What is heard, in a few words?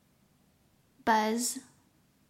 buzz